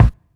tight low end steel kick drum a key, bass drum sample; kick drum samples